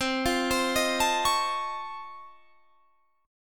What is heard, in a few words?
Db Augmented Major 9th, C